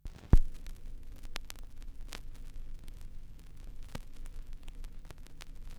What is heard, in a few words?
Stylus Down, Vinyl Noise